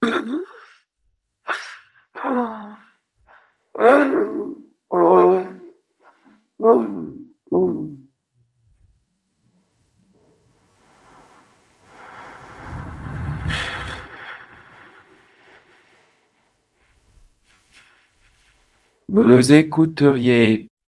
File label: jingle